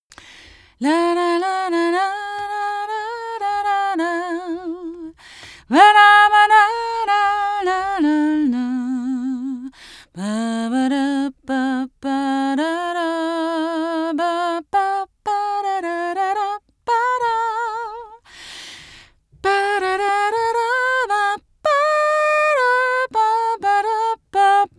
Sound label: female sing jazz impro long